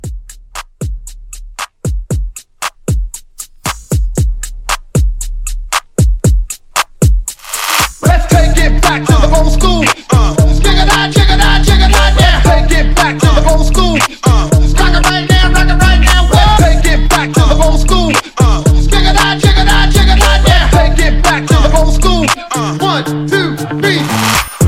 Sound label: Hype Edit 116